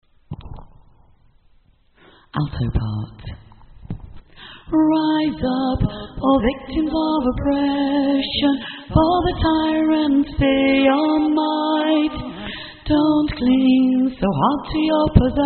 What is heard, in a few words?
Alto; manchester